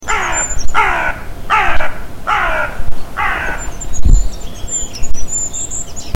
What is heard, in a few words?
Carrion Crow